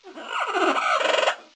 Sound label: bird green; minecraft; technic